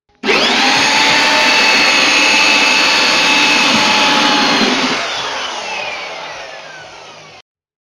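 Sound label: drill sound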